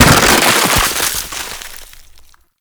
Frost Snow Globe Burst; Warframe

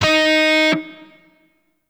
Shawzin Oct Three D Sharp; Warframe; Grineer Shawzin

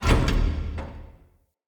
Vent Close; Encounters; Ode Pollution Tower

